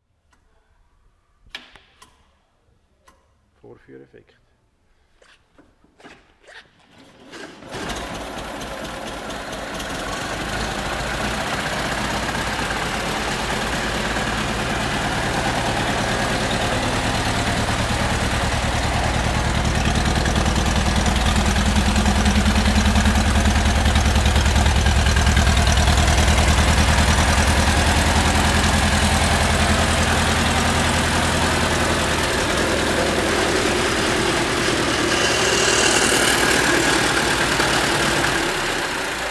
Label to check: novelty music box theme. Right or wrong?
Wrong